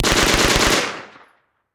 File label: Submachine Gun MP40